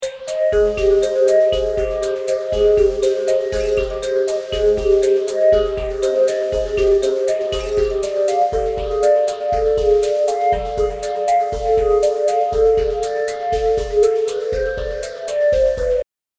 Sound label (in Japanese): ミステリー・ホラー・探索パート｜不穏な感じムンムン